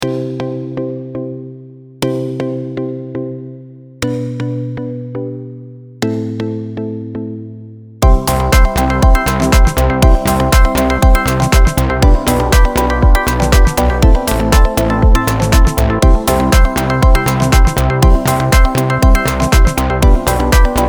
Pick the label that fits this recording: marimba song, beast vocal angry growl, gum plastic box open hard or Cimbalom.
marimba song